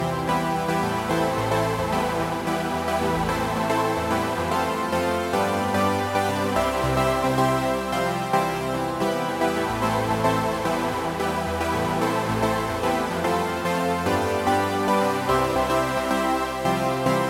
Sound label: supersawpad before